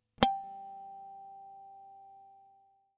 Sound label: harmonic wrong